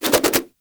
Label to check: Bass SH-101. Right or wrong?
Wrong